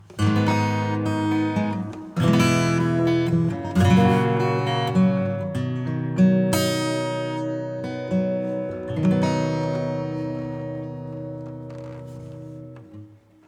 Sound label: Plate acoustic modulation; PLATE-140